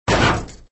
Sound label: creature Death; fratboy